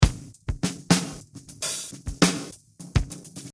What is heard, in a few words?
scrambled drum Loop